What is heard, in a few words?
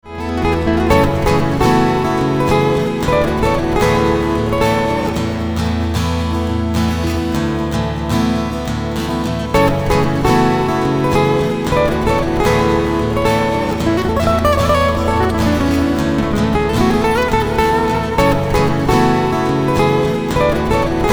Acoustic, acoustic guitar